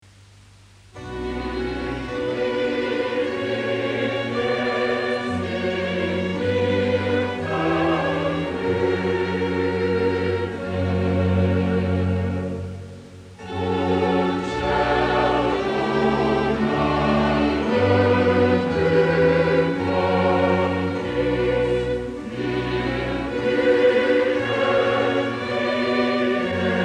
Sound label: Choral